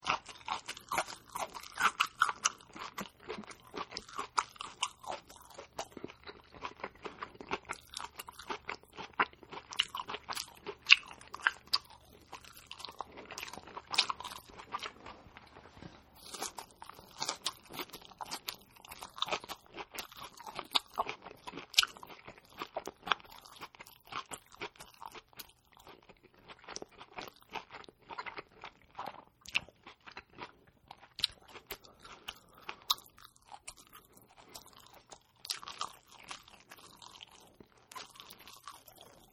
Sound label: Man Chewing, Man Eating Various Type Of Vegetables And Meats, Chewing Very Thoroughly; Eating Sound Effects; Human